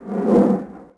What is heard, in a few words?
roar, creatures; bantha; Star Wars Obi-Wan; XBOX